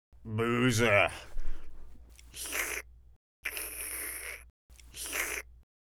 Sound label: boozah w slurps